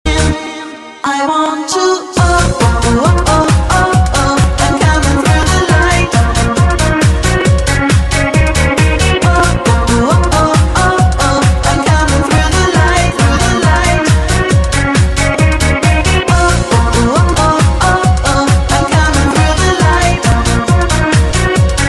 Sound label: dance